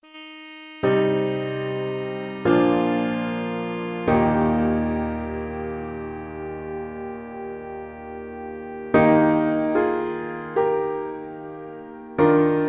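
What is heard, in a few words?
Mahler 2, alto saxophone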